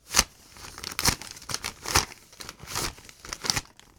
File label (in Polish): Banknoty Liczenie, cash counting